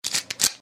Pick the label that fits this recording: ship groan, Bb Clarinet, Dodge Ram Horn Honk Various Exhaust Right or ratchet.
ratchet